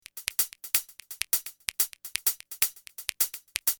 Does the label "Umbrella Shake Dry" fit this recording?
No